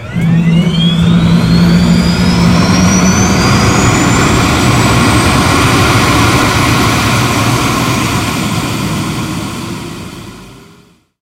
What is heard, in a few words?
jet engine start; War Thunder